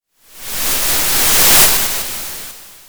Sound of waves crashing on a shore

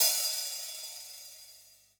Elec Op Hat, drum

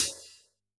Hf Hat, drum

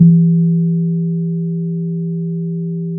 Sound of a church bell

